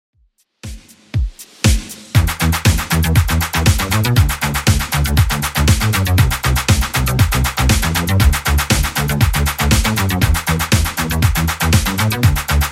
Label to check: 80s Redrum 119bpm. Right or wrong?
Right